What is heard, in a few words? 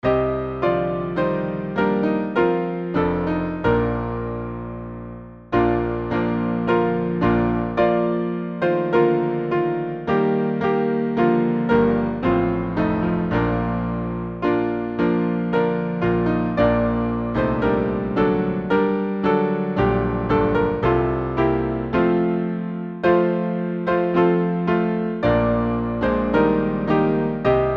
Hymn piano; Holy Father great Creator; Regent Square